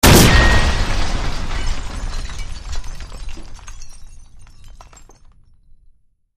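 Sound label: Explosion Glass Debris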